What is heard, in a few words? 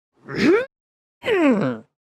Big Raccoon Idle; FlyForInsanity